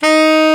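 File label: TENOR HARD D#, TENOR SAX; Northstar - New Gold VOL-1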